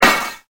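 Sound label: DISH CRASH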